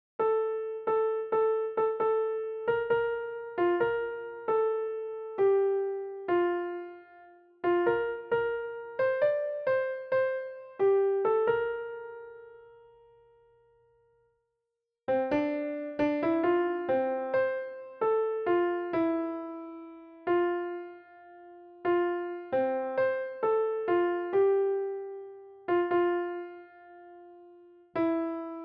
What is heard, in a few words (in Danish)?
sopran